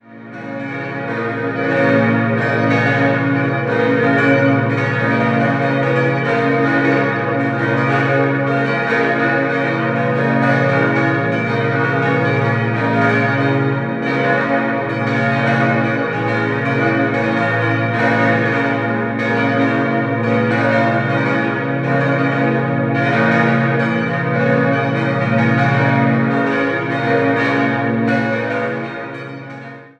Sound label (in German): Rothenburg LU, glocken